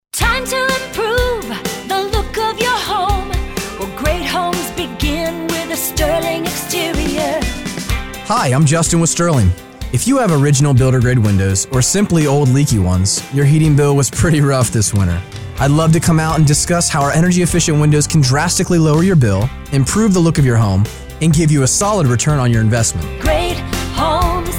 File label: Windows Jingle